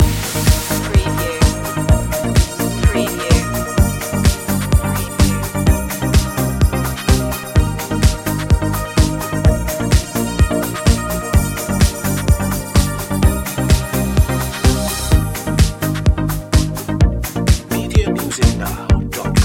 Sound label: funky digits loop